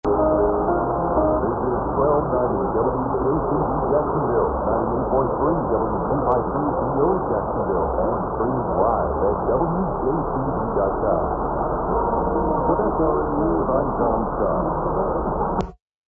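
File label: Jacksonville NC, radio; WJCV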